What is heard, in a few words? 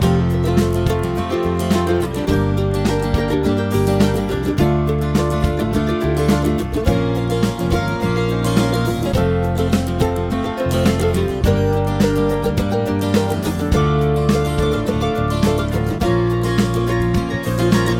chord prog with mixture